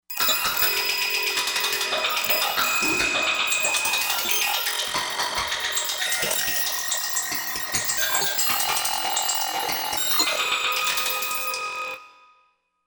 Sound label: Clockshop